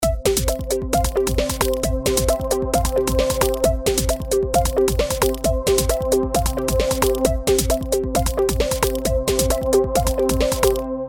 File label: awesome beat; Fruity Loops